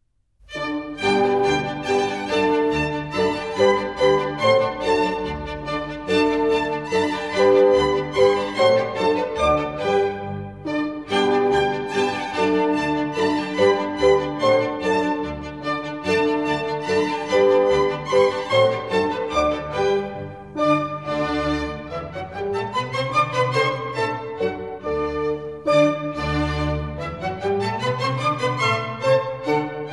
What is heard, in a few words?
German Dances, G Major